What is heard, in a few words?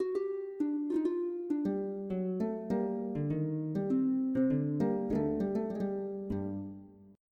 Gigue